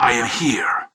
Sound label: battlecry; tf